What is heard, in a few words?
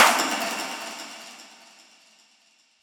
Impact